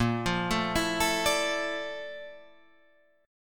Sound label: A sharp Major Sharp 11th